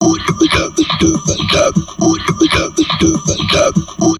TALK, Drum Loops Crazy Processed

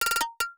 Triangle Thing Loop; Super Fantasy Zone; M8; Genesis

